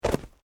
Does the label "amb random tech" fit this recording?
No